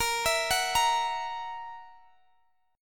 E Suspended 2nd Flat 5th A sharp

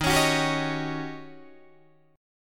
D sharp 7th Sharp 9th, Guitar, Open D

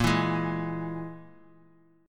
Gsharp Suspended 2nd Sharp 5th; x,1,2,1,x,x

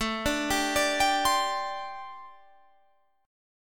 G Suspended 2nd Suspended 4th

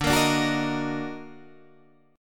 Eb Minor Major 7th D, chord

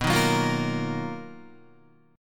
B-Major Sharp 11th B down Guitar; BM#11; strummed; JGuitar

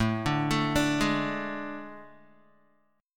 A Major 7th Suspended 4th, Guitar; AM7sus4; arpeggiated; 5,5,7,7,8,x

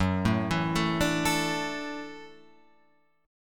Gb Minor 7th Sharp 5th, Guitar Open F; arpeggiated; JGuitar

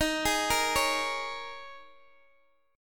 Eb 7th Suspended 4th Eb, Guitar Open G

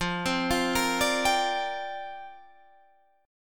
C 7th Suspended 2nd Suspended 4th F

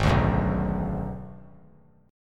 Ab Minor 7th Flat 5th Ab, down, 3C, 3F, 3A, 3D; strummed; JGuitar

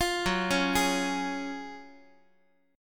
Gb Major 7th Suspended 2nd, Guitar; arpeggiated; x,x,8,4,5,7; JGuitar

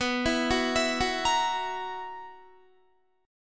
B Major 7th Suspended 4th, Guitar; arpeggiated; JGuitar

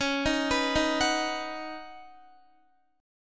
Db 7th Suspended 2nd Suspended 4th, Guitar; x,16,13,16,15,13; Db7sus2sus4 arpeggiated

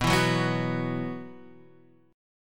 B Suspended 4th, Guitar, Standard; 7,7,4,4,7,x; strummed; JGuitar

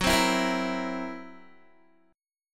Gb 7th Flat 5th Gb down Guitar; Listen to Gb7b5 strummed

